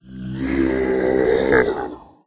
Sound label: Lost City Groan